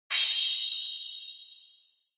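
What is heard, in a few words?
Onoma Flash Low, 光る; オノマトペ 光る11-2(低)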